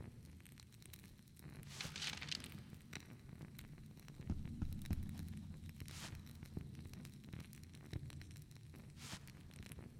accountant Idle; fairymirror

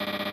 Robot Dance Snd; eldorado

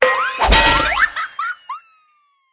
bonus Arrow Direction Movement; fairyred

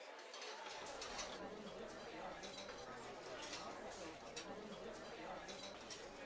ambience General; magic maid cafe

